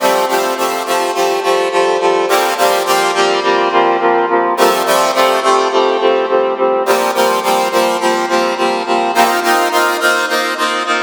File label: Freeze WAVETABLE